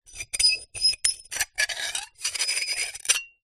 glass shards x2 scrape together; foley

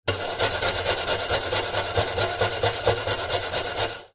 industrial wire brush on metal hollow

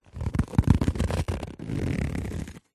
cardboard envelope box handling rough fingers rub; zapsplat

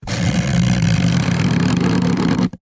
cardboard box flap move slow creak

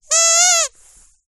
toy squeaky squeeze single

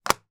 leisure game pick up sticks in box down on table

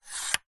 technology mixing desk fader slide fast; zapsplat

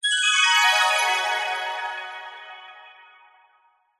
magic glissando 90s style dreamy descend; fantasy; zapsplat

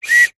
foley whistle short toot

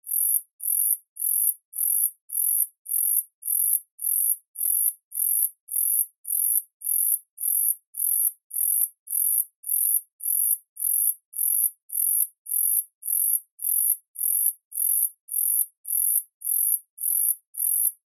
animals insects grasshopper

